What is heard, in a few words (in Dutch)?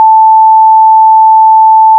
eerste boventoon